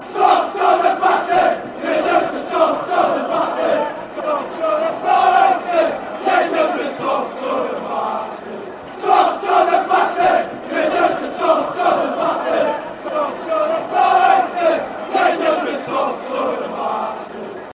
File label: soft southerners, chants; carlisle